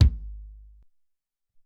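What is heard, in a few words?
Long Kick 4, Alesis SR-18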